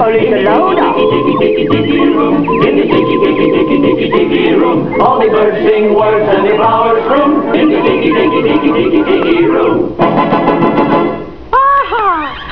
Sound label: Music from the Tikitikiroom; recordings from Disney theme parks and movies